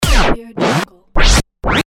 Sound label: vinyl